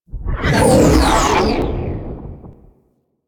Sentient Ship Close Short Fly By; Warframe; Enemy Ships; Space Fighter Sentient Coil Agent

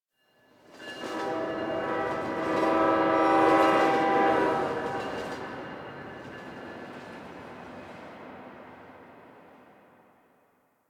Distant Train; Warframe; Ambience; Nineteen Ninety Nine

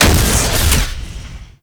Flak Cannon Alt Fire; Warframe; UT Flak Cannon

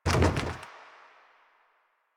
Pylon Plastic Roll

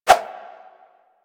Spheres Throw Whoosh; DE; Warframe; Weapons; Solstice Spheres

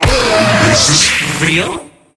Pain

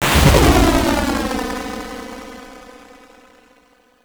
Sanctuary Target Destroy; Warframe

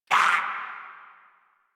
Pain, Dialog, Barks, Battle; en; Warframe; Grineer

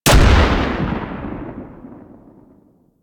Sniper Rifle Low Sweetener; Warframe; Tenno

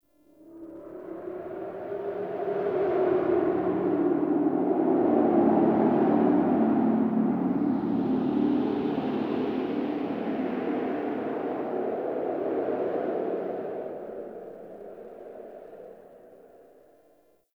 Dark Wind Misc; Ambience; Warframe; Nineteen Ninety Nine

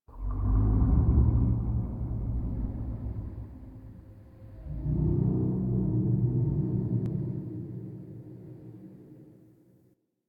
Interior Texture Large Moan; Ambience; Misc Indoor; DE; Warframe; Infested Eris

